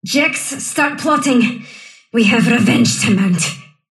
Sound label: sylph death; dota; dark willow